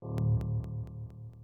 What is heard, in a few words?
activate; gnome-audio-k1; gtk-events